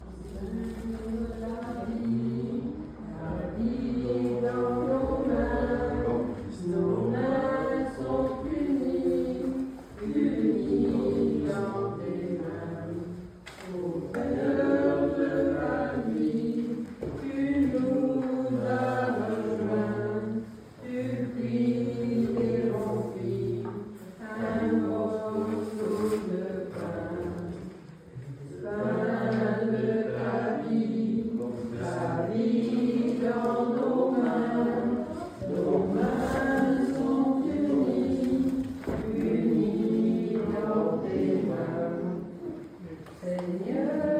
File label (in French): EGLISE LE PAIN DE TA VIE; VILLEFOLLET 79; ASCENSION